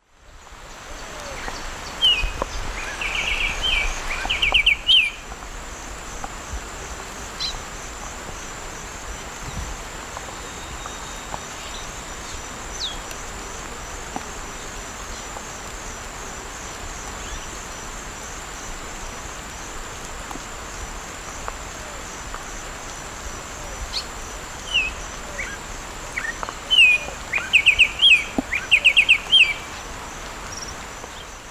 Arremonops conirostris, song; S. America; Eastern Lowlands

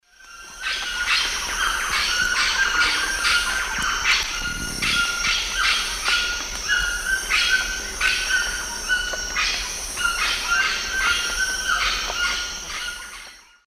Pionus menstruus, flight calls; S. America; Eastern Lowlands

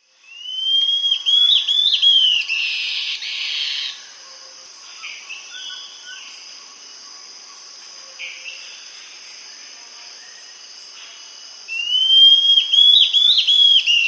Hairy-Crested Antbird; S. America

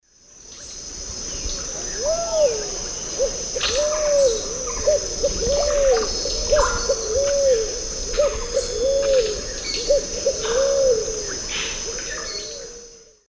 Patagioenas cayennensis, song; S. America; Eastern Lowlands

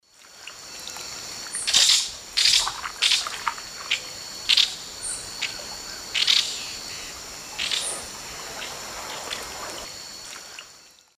Chloroceryle amazona, flight calls; S. America; Eastern Lowlands

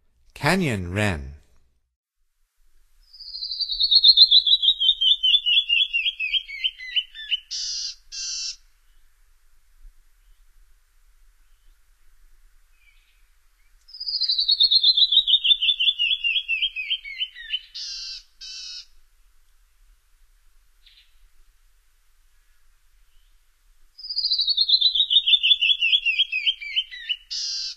Canyon Wren, Songs of Birds of North America; Stokes

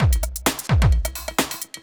electro kit 130

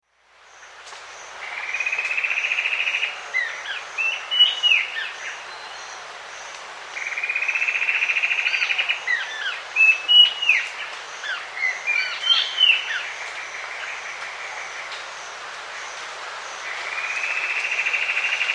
Amazonian Streaked-Antwren; S. America

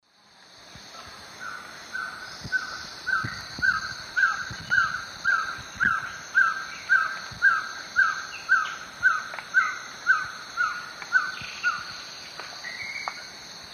Trogon violaceus, song; S. America; Eastern Lowlands

